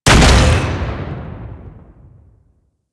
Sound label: Flak Cannon Shot; Grineer